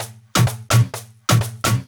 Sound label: Houseloops 128BPM; Vengeance Samples